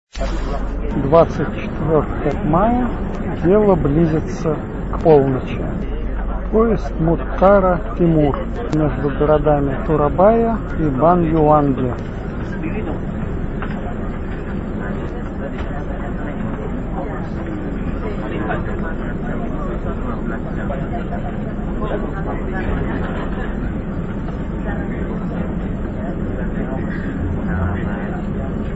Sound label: indonesia sounds; geopoesia